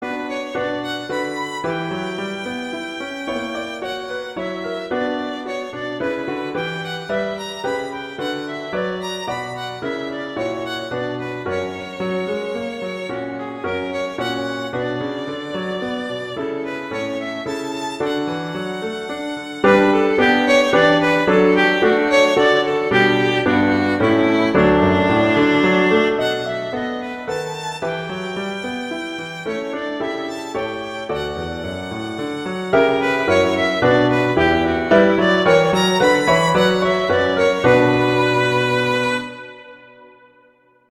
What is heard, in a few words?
Minuet; Bach; Suite 2